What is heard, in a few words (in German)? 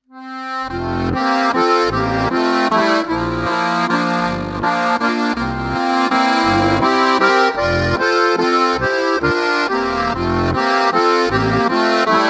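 Cassotto drei chörig, Harmonika; Rumberger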